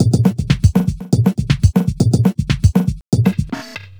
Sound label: Scarred Beat, Electronic Beats; Sonic Foundry (Sony Creative Software); Myths of Technology